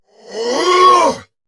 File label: Attack Effort; Warframe; Dax; Dax Ashigaru; Dialog Barks Battle